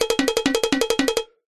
cartoon run scramble percussive cowbell

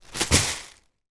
foley bag plastic down on floor contains fruit; zapsplat

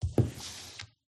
Book, Slide Across, Movement, Handling; PMSFX

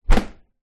household dishwasher door close

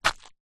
footstep single sandal woods ground stoney path

